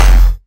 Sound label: hit heavy; Sfx; freepixel; Tilter